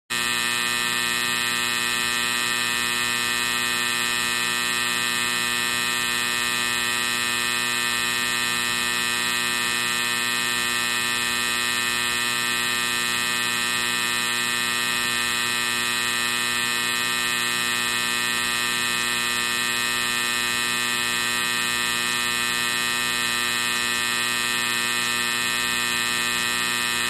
Medium Pitched Buzz, buzzers

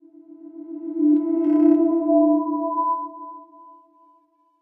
TRANSPORTATION, drones & tones

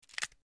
Break Or Snap Vegetab; punches kicks hits bones

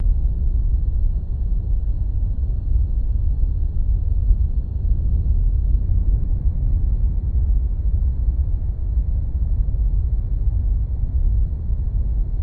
Earth Winds Loop, earthquakes

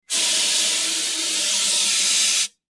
High Pitched Fire Ext, fire extinguisher

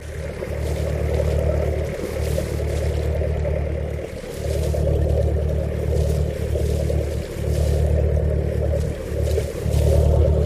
Fishing Boat, Exhaust Port, With Wake

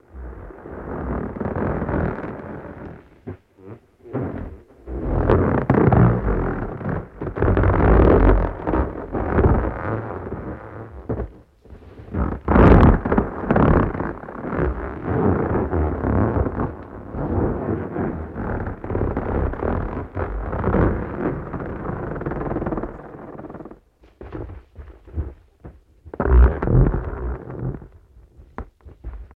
Earthquake Fissure

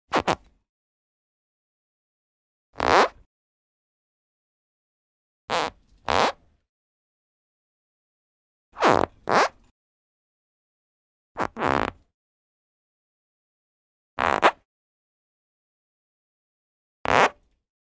Skin Stretching Frict

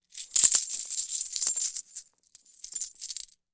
Coins Jingle

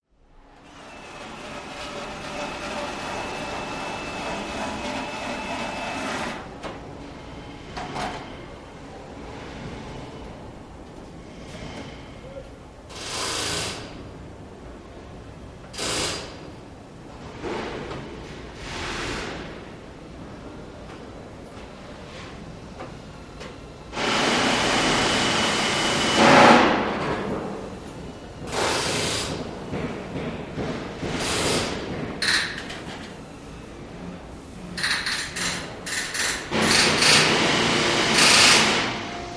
Assembly Area Rivet

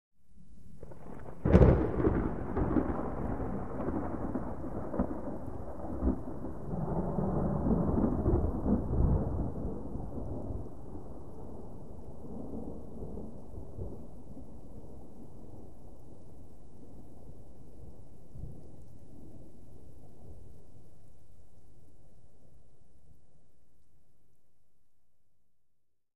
Thunder Medium, cu

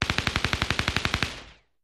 Gunshots Machine; fires

